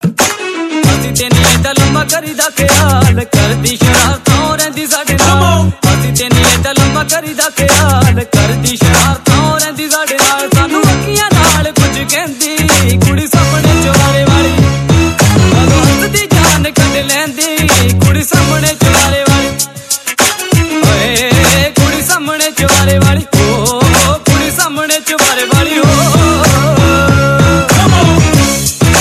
pop ringtone

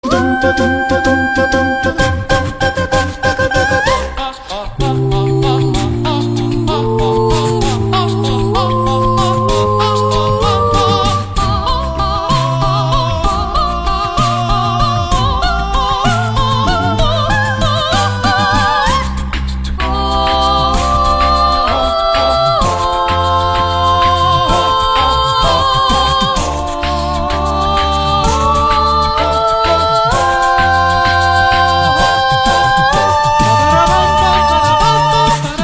Acapella; UEFA Europa League

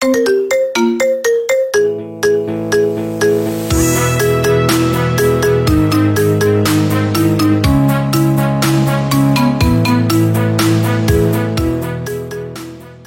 Marimba Remix

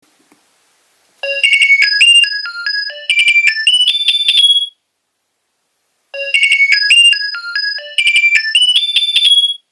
Nokia 2300 Ringtones

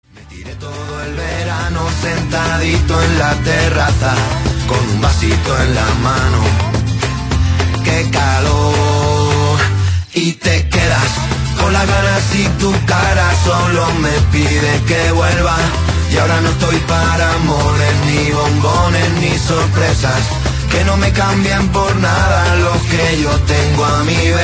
Rumbapop

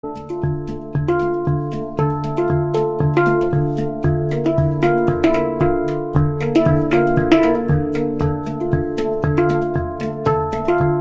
Hang Drum

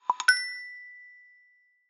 Google notification

